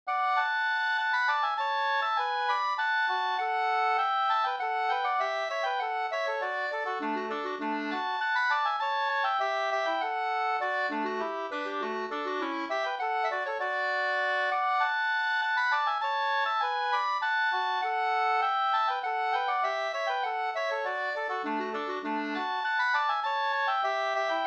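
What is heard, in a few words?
polska, two-part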